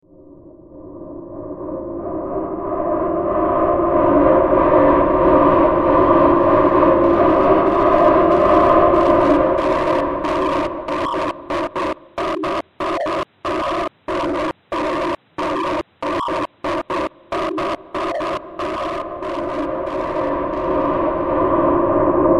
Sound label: strange sound; thesecretworld